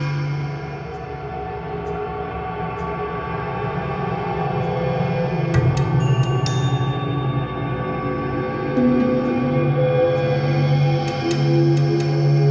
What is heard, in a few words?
Ambient Dungeon Loop; Eternal Edge